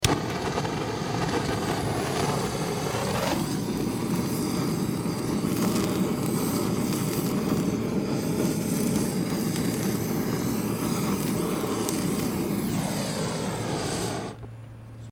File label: gas burner